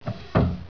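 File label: door close; THE REALM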